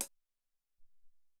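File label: Closed Hat, Alesis SR-18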